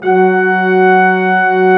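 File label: Brass Ensemble long; Roland L-CD702; Lrg.Ensemble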